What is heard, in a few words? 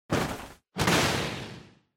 Motion Fall Stumble